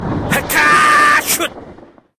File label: shoot; Haka